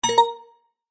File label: game tone synth bright organ style short stab alert